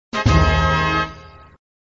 Music, flipper; sttng

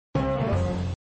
Sound Fanfare loosing (No Match), flipper

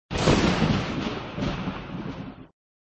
Sound Thunder far away, flipper

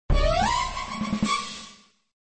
Sound Narrow escape sound effect; flipper

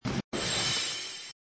Sound Mine cart turn sound, flipper

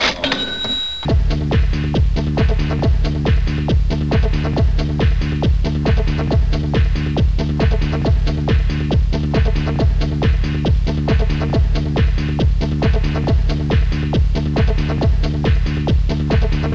Music loop; Music HiLo loop

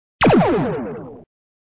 Sound SFX, flipper; sttng

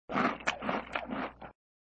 Sound Chewing tone, flipper; Monkey brains

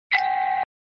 Sound SFX, flipper; sttng

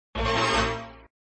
Sound Düddel dü dü (Return lanes), flipper

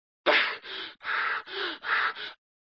Sound Exhausted breathing; rope bridge

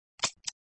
Sound Empty gun; Empty gun (during attract mode, trigger pulled); flipper

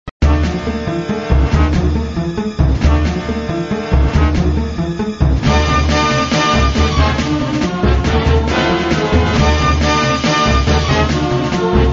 Music, flipper; sttng

